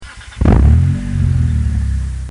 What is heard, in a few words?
Dodge Stealth Starting